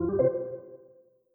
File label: powerup